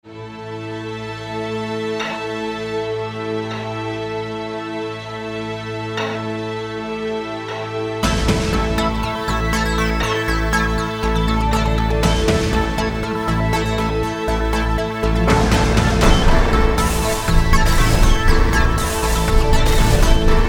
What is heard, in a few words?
Strings From Metal